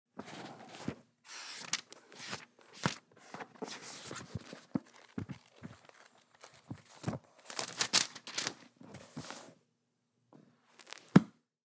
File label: Book Foley; Dubstep; Ghosthack; Dubstep Sound Arsenal; Foley Percussions